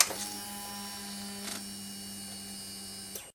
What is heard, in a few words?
suzuki c40 mono mic fuel injector, motorcycles; auto